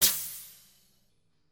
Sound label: air release; spaceship